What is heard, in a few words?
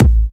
loud kick drum sample A key; kick drum samples